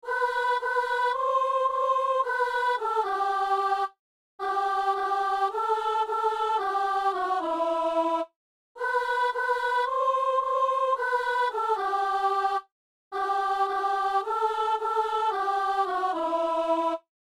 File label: soprano; Messe du 17 janvier à Saint-Denis; Messe du Frat - Kyrie